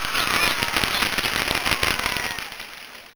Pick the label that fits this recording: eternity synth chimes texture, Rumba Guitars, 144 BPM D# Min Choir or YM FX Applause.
YM FX Applause